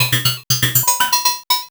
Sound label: POLYMOD; AMG - Vince Clarke Lucky Bastard